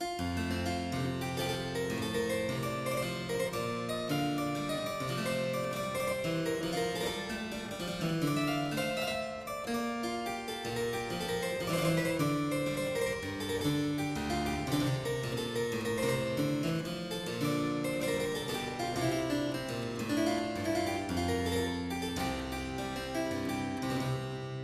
Suite in G major - Double de la Courante, Harpsichord; Jacques Champion de Chambonnieres